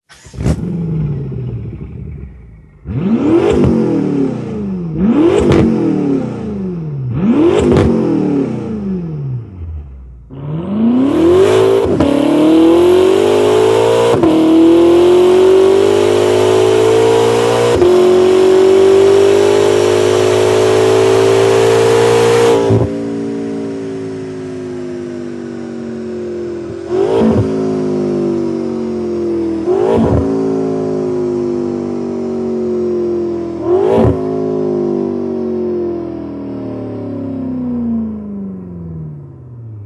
BMW M52; Evolution

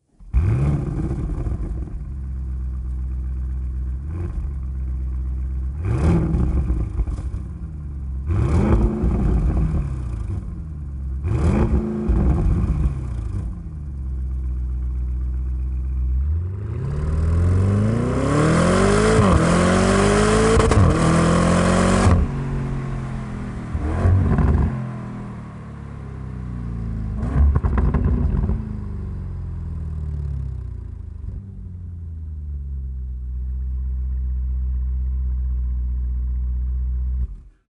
AUDI RS6 OPF; EVOLUTION LINE

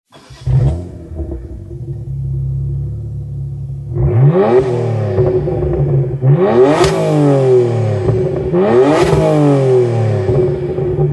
Slip On BMW 1 Series M

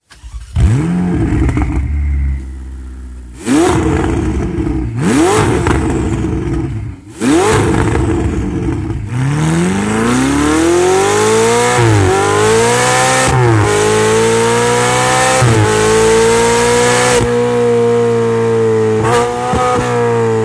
Link Pipe set w Cat Ferrari 488 GTB

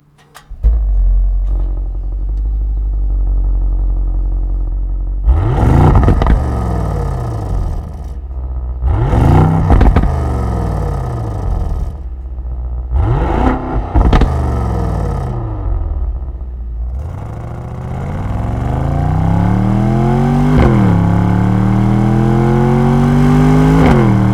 EVOLUTION LINE MERCEDES AMG GLE 63 S

